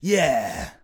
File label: Woohoo; StowAway